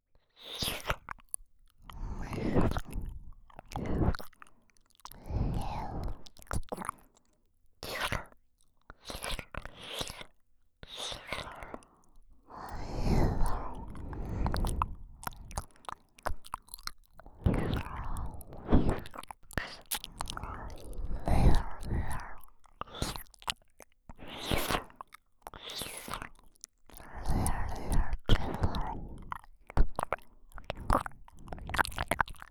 耳舐め(右耳); おまけループ音声

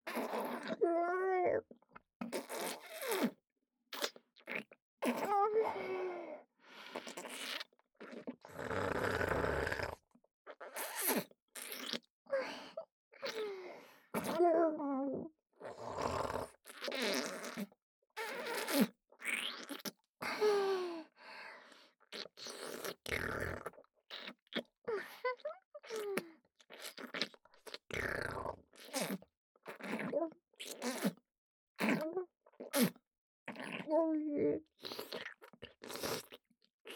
無垢 ねっとりフェラ; フェラ素材

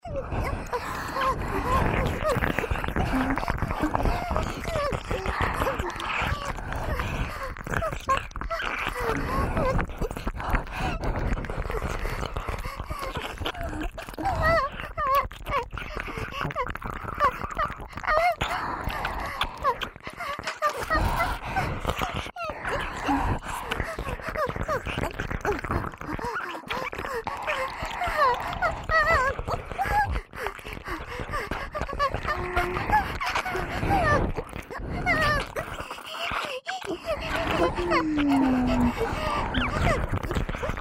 本番喘ぎ SE無 ＋両耳れろれろ舐め; おまけループ（オナサポ）; 3WAYループ

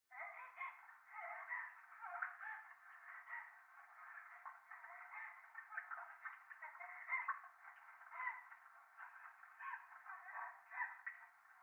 トイレ 喘ぎ 雨上がり

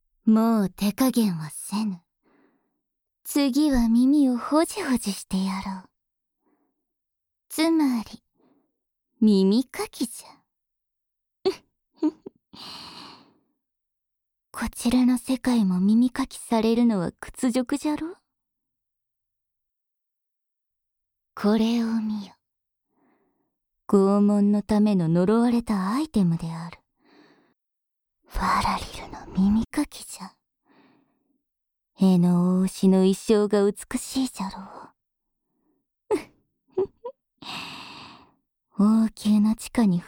耳掻き、耳舐め 左; 闇クラウリーナ; 異世界ではエッチなことの耳掻き、耳舐め（左）

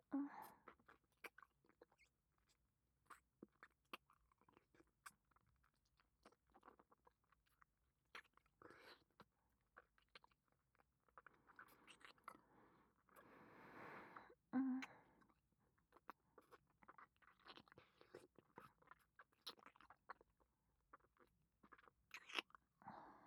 キス、左右耳舐め, ASMR; アドリブ集

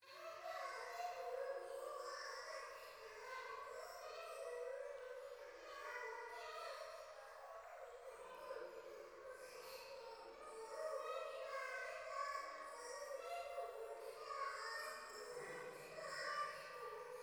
可愛い喘ぎ 川のせせらぎ; 夢の中

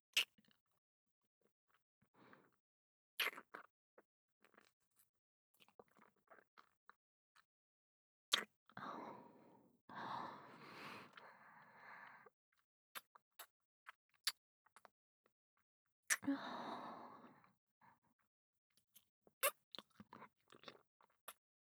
キス; アドリブ集

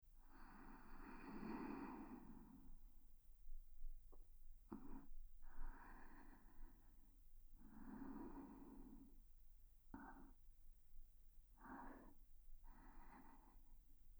色白エルフお姉さんの安眠おまんこ寝かしつけ

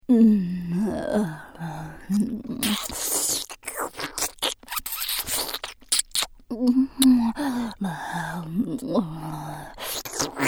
ちゅぱちゅぱフェラ; メインキャラ

